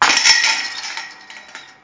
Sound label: Disc Golf Catchchain